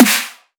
snare dnb

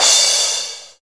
Crash, Roland MV8800

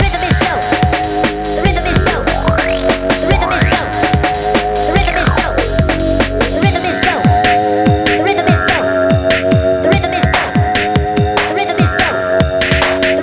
Riddim 145 Ebmin, Hardcore Breakbeat; Big Fish Audio; Hardcore Breakbeat Action

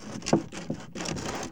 LITE BOX; Ambient Sounds; Box Noises; E-MU Producer Series Vol. 3; Hollywood Sound Effects

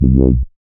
SYN MC WAH, Roland MC-202; Sound & Vision - Gigapack I CD 2

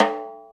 Folk Snare Shot

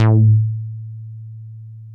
A2, MOOG1 BASS

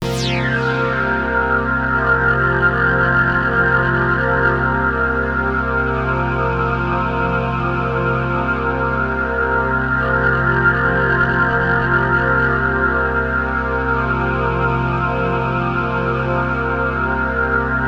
Access Virus B; Synthworx

